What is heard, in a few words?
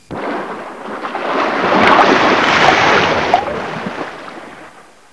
Water, 水声; 特酷声音素材库